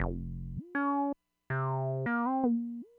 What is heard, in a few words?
slowsong